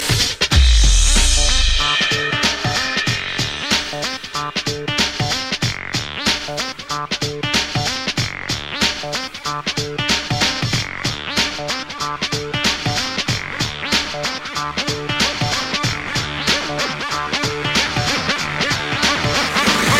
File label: Ringtone